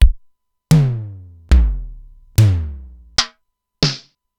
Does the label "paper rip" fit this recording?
No